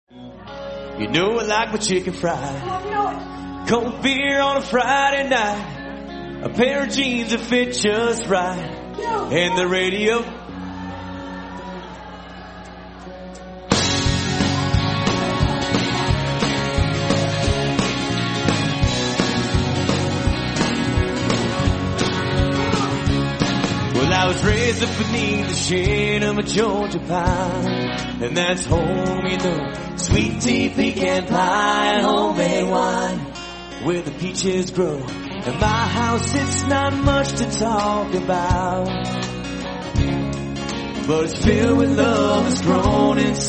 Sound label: Country Band